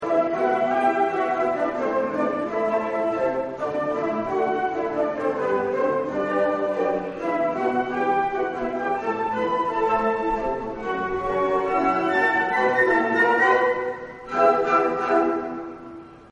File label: concert, flute